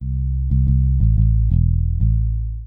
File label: C Bass r n b 90 bpm c; rhodes; skyrider; Electric Piano Rhoads; Various Keys